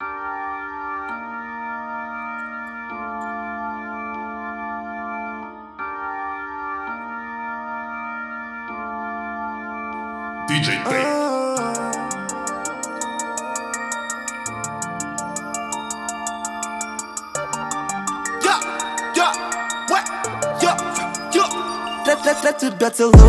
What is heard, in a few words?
trap